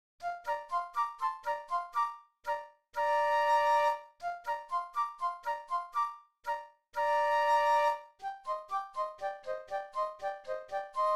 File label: flute duet; classical